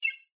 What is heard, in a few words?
science fiction computer console beep button press